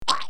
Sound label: slimy monster or murderd sound